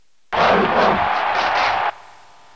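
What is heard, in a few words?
Cheer Japanese; Captain Falcon; SSB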